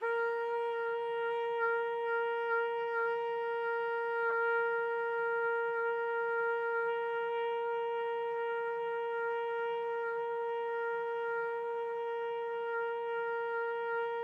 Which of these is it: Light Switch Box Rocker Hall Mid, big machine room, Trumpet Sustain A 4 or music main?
Trumpet Sustain A 4